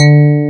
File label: E PNO G1, SYNTHESIZER; STACK SYN; STACK SYN #2